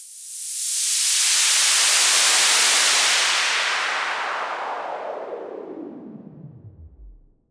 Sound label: Fall Clean, Falls